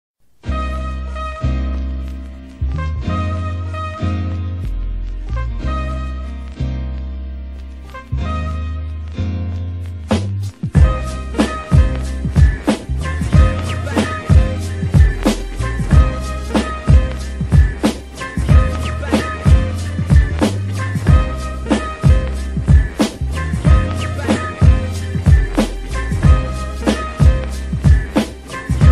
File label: instrumental